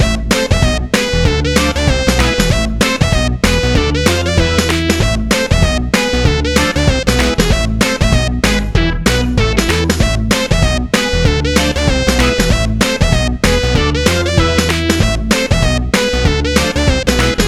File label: Robot Chase